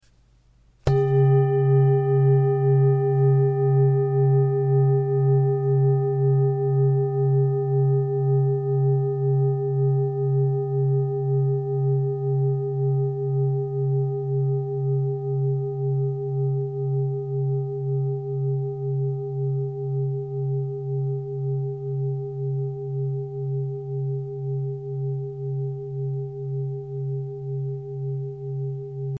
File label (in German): Klangschale